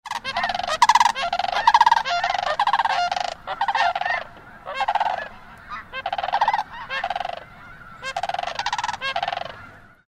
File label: sandhill crane